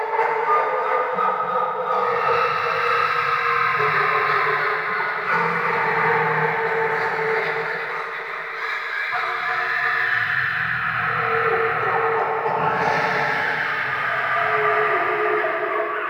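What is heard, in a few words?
Disturbing Creature Loop; Warframe; Shadowgrapher; Interior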